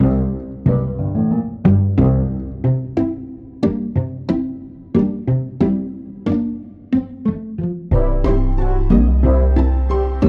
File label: No Perc